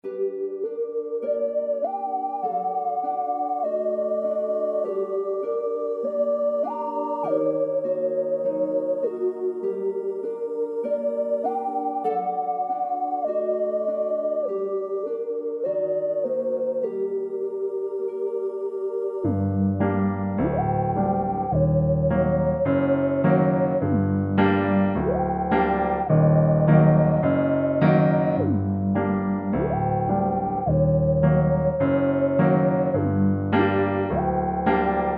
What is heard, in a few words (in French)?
onde martenot; sleepy hollow